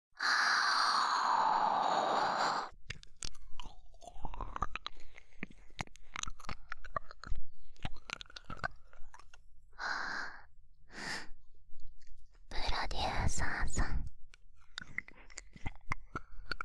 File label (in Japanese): 耳舐め→素股, ASMR; アドリブ集